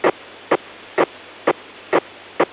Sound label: mobitex